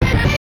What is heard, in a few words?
jingles HIT; Kenney's Sound Pack; Music Jingles